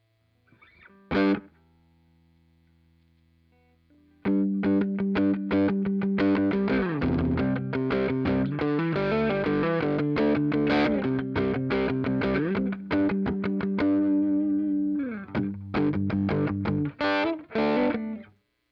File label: schect r121 rat